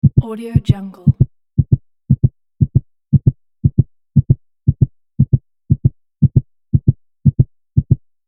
fast heartbeating

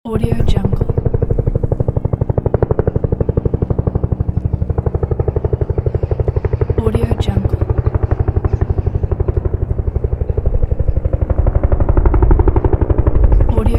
sound of helicopter flying over zoo

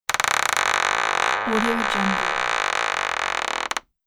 screwing wood creaks

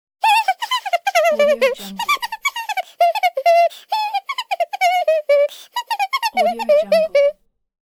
cartoon character humming

